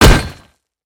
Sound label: WPN Impact; creatures organic